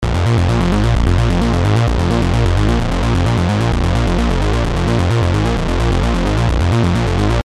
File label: bass